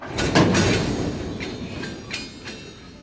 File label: shunting track, train; best service Blue Box